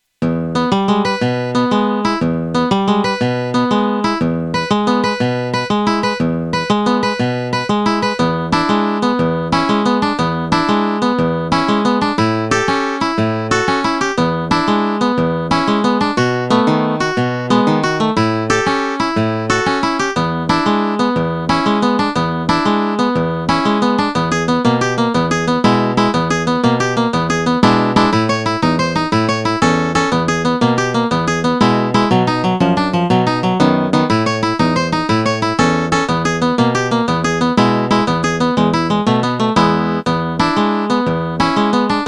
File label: Echo Boogie